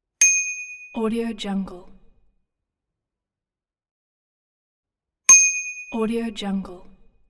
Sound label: bicycle bell